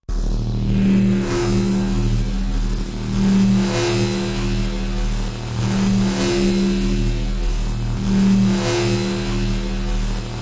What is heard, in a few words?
Atomic Pendulum, Machine, Low Pulse, Moving Sweep, Sci-Fi